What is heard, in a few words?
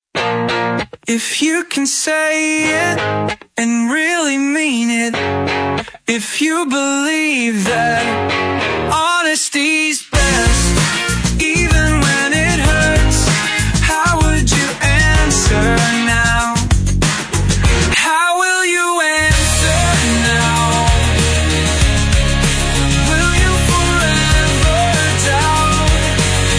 Indie G-funk; Alternative Rock